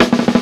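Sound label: snare roll, Ragga & Dub Reggae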